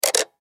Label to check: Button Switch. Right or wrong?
Right